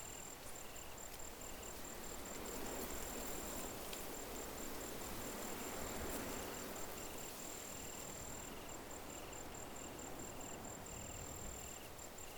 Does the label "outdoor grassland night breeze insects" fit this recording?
Yes